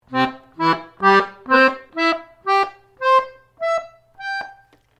PIANO ACCORDIAN ARPPEGIO SHORT, Old Piano accordian; Pianos; Musical